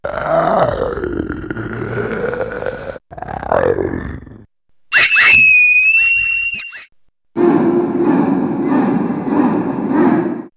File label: Fear Chant To Beelzebub; vox mix; X-Static Goldmine